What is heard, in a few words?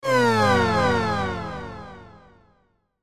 Synth Descend Delay; Blastwave; Descends